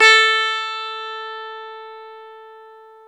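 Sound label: E 12 String guitar; Club-50 - Foundations Roland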